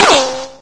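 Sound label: ricochet; svencoop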